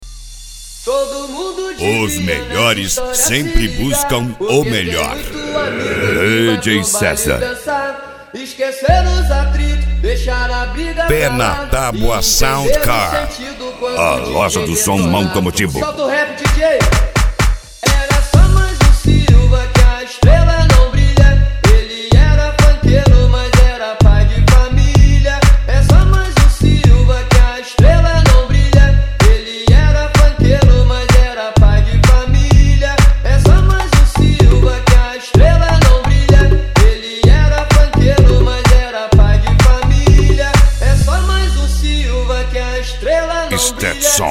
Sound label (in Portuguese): sound car carnaval